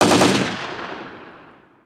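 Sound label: dist gun, distant gun, ambient outdoor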